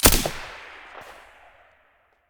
vss silencer stereo, weapons

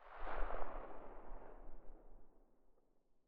silencer sv98 mono very far

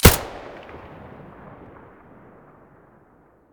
aps muzzle stereo; STALKER; IWP

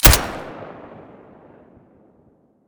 silencer colt1911 stereo

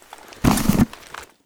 rifle mask use; STALKER; IWP; item usage

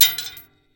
Shell 39mm metal; STALKER; IWP

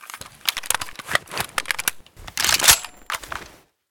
galil reload empty, weapons

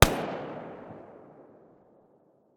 mdr silencer mono distant, weapons

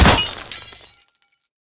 grenade glass; STALKER; IWP

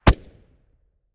fiveseven silencer mono far, weapons

